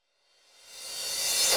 Cymbals Reverse Crash; Vengeance Samples

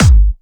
Bassdrums UK Trance Kicks

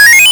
Arcade Riffs

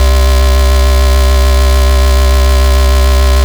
Basses C; Vengeance Samples

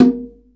Percussion; Vengeance Samples

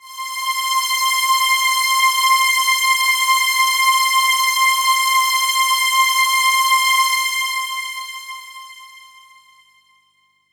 Synths HiString C; Vengeance Samples

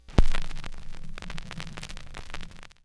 Record Start, Vinyl Record; Record Noises

